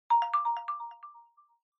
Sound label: Smartphone Alarm Speaker